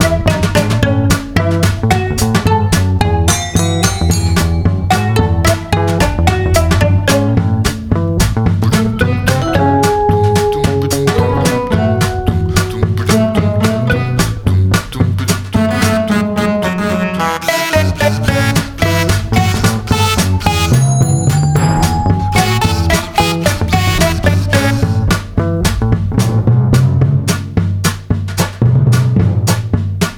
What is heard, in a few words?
Music; cupcakeLand